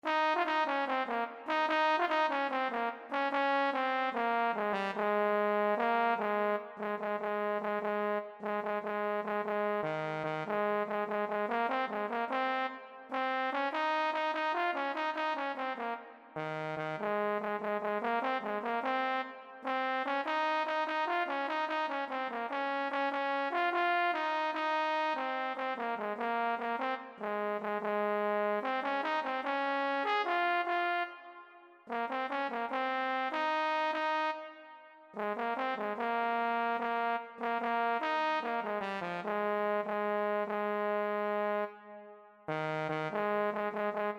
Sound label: euphonium